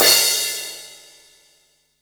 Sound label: crash R; Zero-G; Chemical Beats; CRASH ZOO